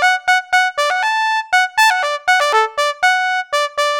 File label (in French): militaire, arturia brass